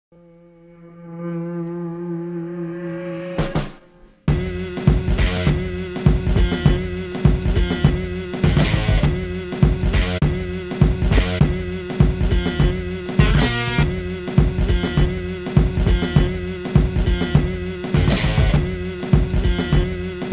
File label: thumper; clean